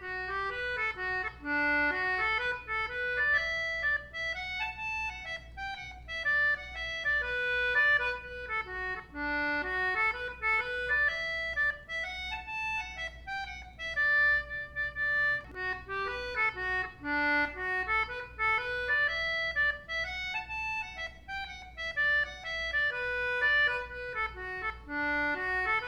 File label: Celtic Button Box, hornpipes; The Boys of Bluehill